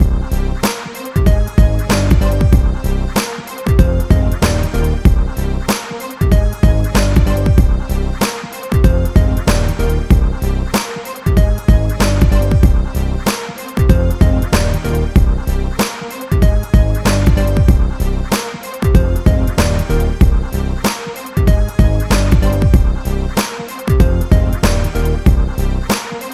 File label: Cypher Beat; MTN Pulse